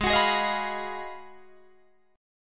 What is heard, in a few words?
Ab Minor 13th, Ab, Guitar, Drop D